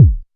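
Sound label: technox bass drum; Quasimidi Technox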